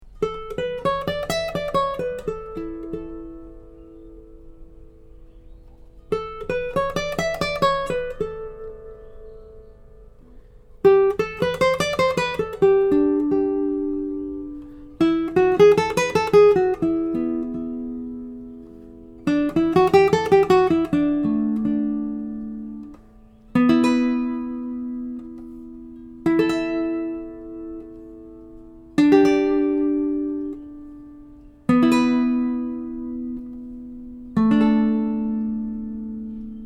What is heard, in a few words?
Resonance; Czobel